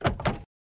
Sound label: Car Door Open